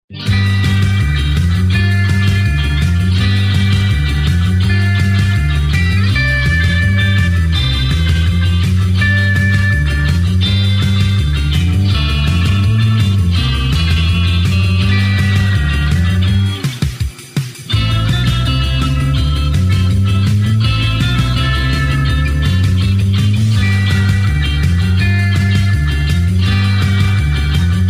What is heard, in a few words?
instrumental